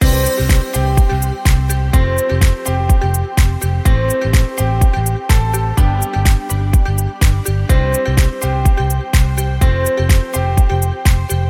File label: Uplifting; Uplifting Success - Loop; MIUI12